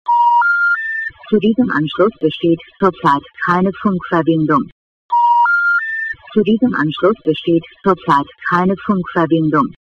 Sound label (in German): C-Netz keine Funkverbindung